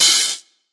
thin open high hat b key, Royality free open hi hat sample tuned to the B note